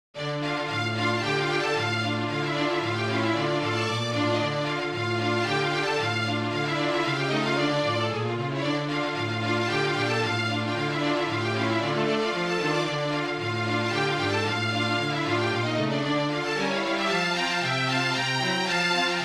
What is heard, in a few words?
Russian Sher, Klezmer, Flute Quartet and String Quartet; Jewish Wedding Ceremony